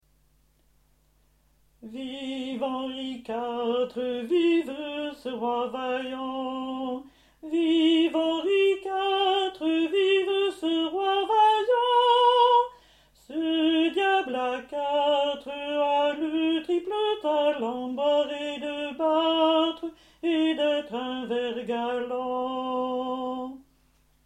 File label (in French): vive henri iv alti